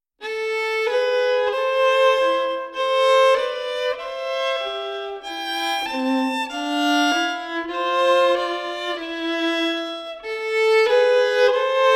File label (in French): corelli gavottina, duo violons